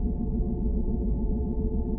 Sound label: TONE; Daikatana